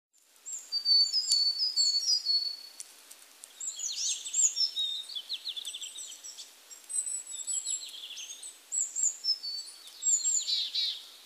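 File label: willow tit; kogara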